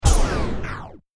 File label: Turntable Scratching Stop Brake; Dj; DJ (Sound Effects:Musical)